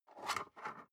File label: storage lid slide open medium, box; objekte